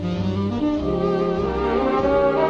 intro, SAMPLED MUSIC; MELODIC SAMPLES

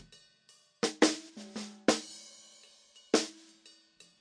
snare mic, drums

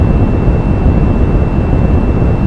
flight; tacscan

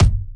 kiks

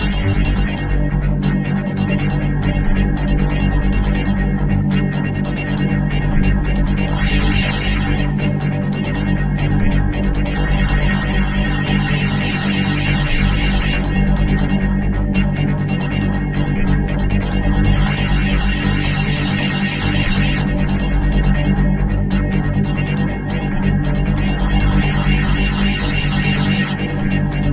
electrocution bass

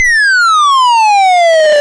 drop, video games

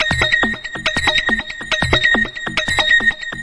140 hexbeat, DRUM LOOPS and BREAKS

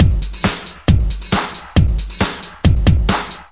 136 bpm, electro punk, drum loops and breaks

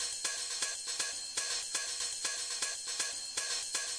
brightbright hats, 120 bpm

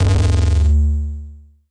nasty stormfront, Electro FX

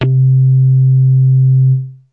sh101, Basses

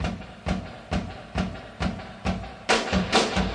135 bpm, juicy bit snares, drum loops and breaks

